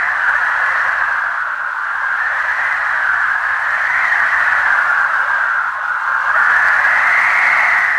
wind; freepixel; Ambient; ambient loops

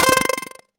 shield spell; Magic; magic spells

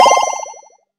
curse apply; Status Effects; freepixel

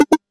chat message; Multiplayer

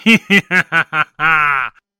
evil laugh; Horror

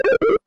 dialogue next, npc dialogue

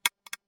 switch lever; Environment

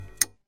switch off; Puzzle

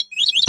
bird chirp; Creatures

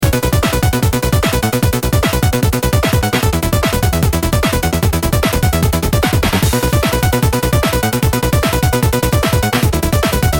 chase scene; freepixel; Action

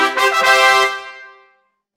level up; freepixel; WordJourney

